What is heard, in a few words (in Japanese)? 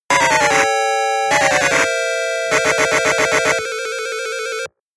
ショッキング; Meldac